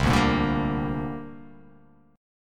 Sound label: C 7th Suspended 2nd